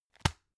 tissue package drop

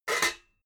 pots clank roomy

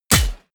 bullet large impact metal

